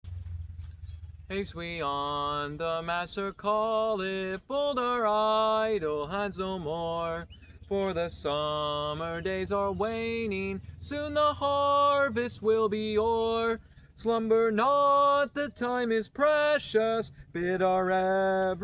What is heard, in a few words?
For Jesus Sake, piano playing